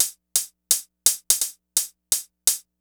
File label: Hi Hat; Sonic Foundry; Sony Creative Software; Hydroponic Hip-Hop; Beat Components; Hi Hat Cymbal Patterns